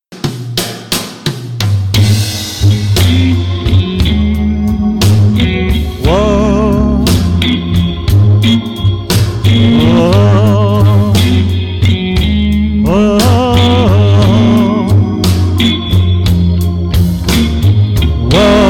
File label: Blues